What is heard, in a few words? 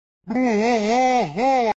bwah; reversevape